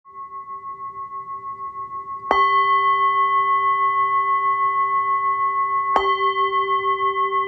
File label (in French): son bol chantant 14 cm